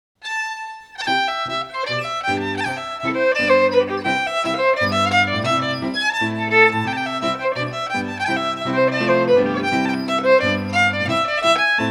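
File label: Reel, Scottish Fiddling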